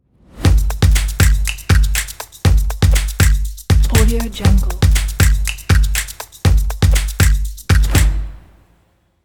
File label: stomp opener logo; raspberrymusic